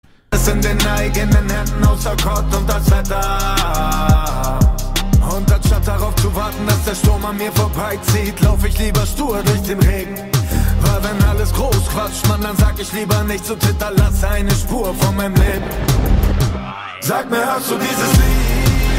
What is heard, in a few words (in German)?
Melodie